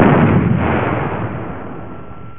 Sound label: explos